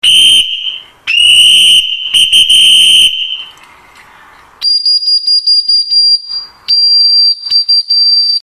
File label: command comb dog